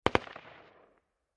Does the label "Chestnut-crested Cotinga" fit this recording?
No